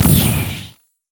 sow the wind; LoL; janna

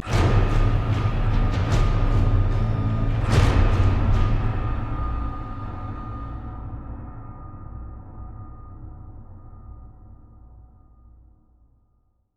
drum tra; LoL; howling abyss phase 3-4

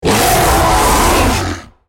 dying; AnnieTibbers

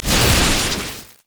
thunder claws; LoL; volibear

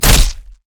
pounce; nidalee base

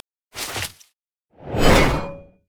recall lead out; riven skin05

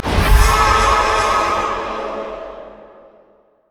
passive dead souls; LoL; thresh

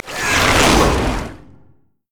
bramble zone splash; Zyra

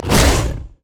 takedown attack; nidalee base

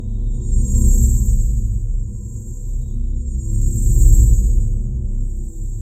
void alone loop; LoL; khazix

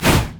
lightsaber missile; riven

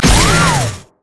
crit attack; rumble skin03

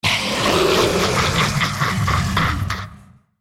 Dragon laugh; Shyvana

